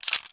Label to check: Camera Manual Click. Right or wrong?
Right